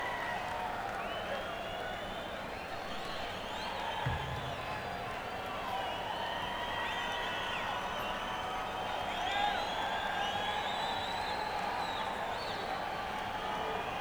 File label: Crowd Tuning; senn me80